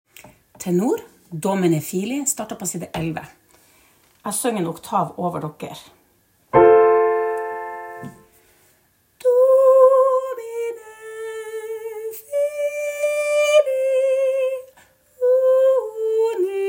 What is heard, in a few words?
tenor; Domine fili